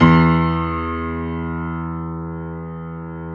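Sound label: piano, grand; Sound & Vision; Gigapack I CD 2; PIA GRAND 1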